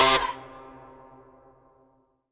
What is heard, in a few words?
Dance Mega Synth; Best Service; 90 s Sample CDs